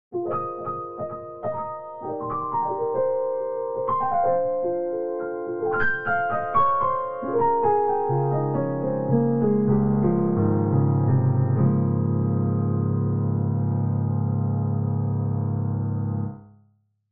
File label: lofi